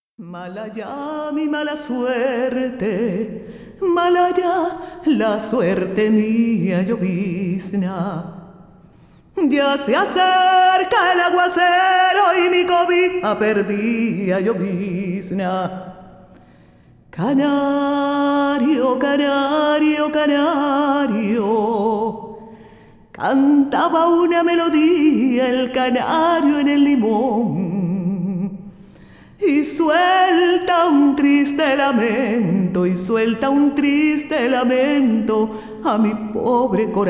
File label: Canario